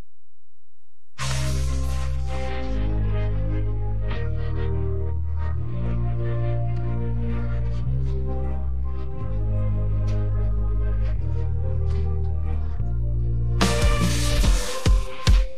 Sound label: no vocals